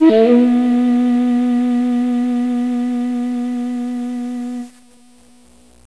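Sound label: shakuhachi; MUSIC; INSTRMNT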